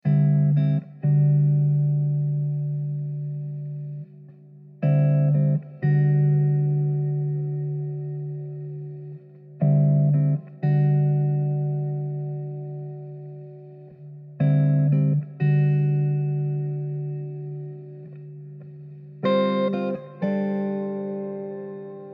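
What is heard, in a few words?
D 100 Jazzy guitar riff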